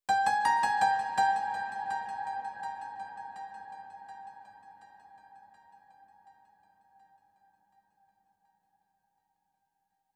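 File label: 165 BPM C Minor Piano, DnB; Melodies; Loop Stems; Chanced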